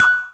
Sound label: coin; Knights-Diamond